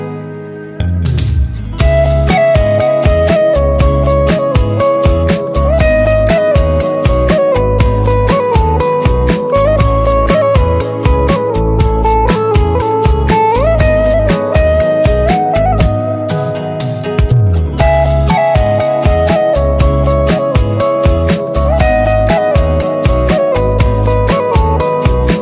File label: Ringtone Mobile